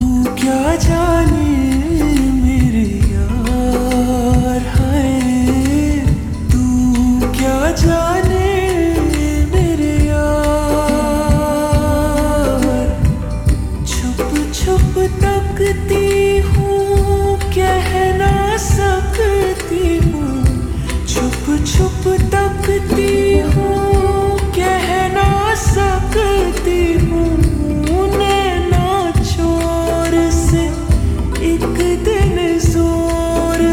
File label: Ringtone Slowed Reverb